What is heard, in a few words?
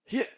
jump; subwayrunner